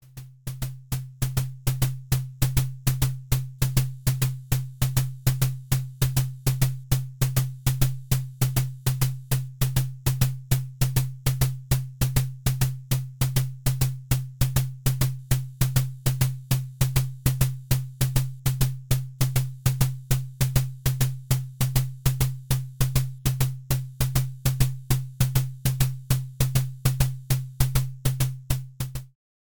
Pittsburgh Modular Lifeforms Percussion Sequencer Multi Layer